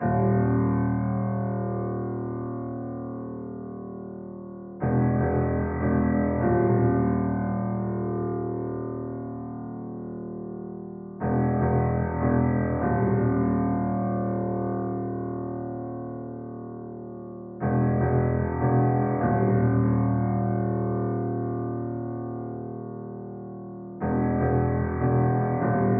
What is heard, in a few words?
150 BPM D Min Bass Piano; Loop Stems; Cymatics; Rowdy